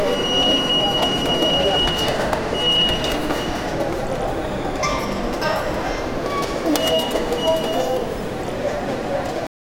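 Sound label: lively 1900 Paddington station, announcements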